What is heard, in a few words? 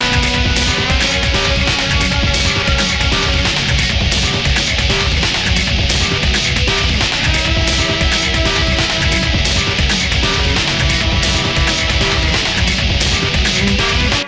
Alien Battle; Lego Battles 2009 NDS